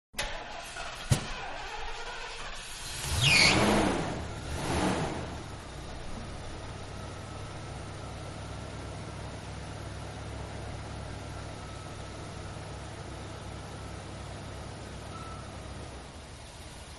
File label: Truck 4 X 4 Start Up Pull Out Of Garage; Trucks (Sound Effects:Vehicles); Truck 4X4-Start-Up And Pull Out Of Garage; Manhattan Production Music